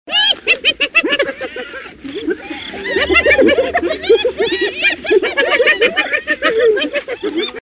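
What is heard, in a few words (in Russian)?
смех гиен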